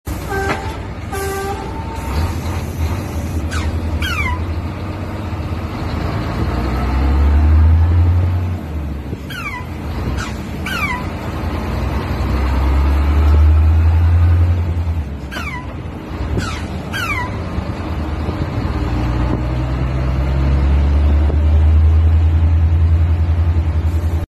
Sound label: FM gear whistle; جیغ دنده یا سوت دنده